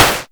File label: ms20 snare; MS-20 drum pack